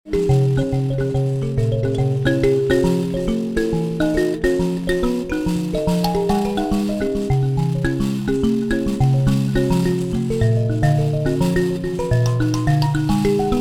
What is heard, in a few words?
mbira